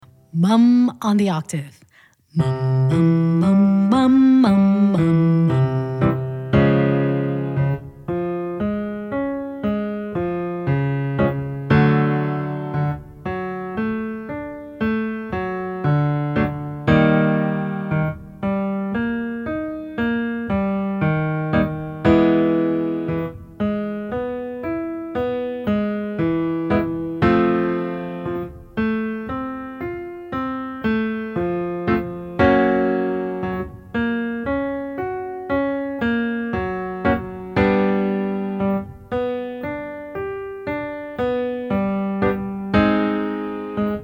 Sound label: octave mum low range, vocal exercises